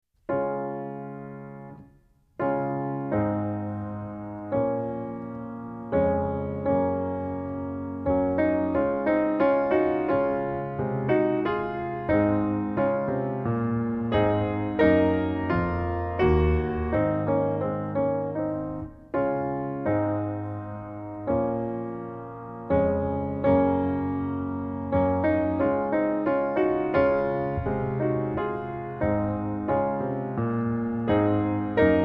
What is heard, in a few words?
Faires Isle Accomp, trumpet; Hal Leonard - The Canadian Brass Book Of Easy Trumpet Solos